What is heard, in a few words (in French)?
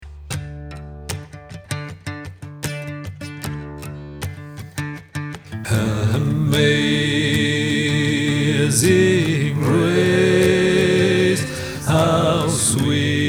Amazing Grace, 4 voix; 2 couplets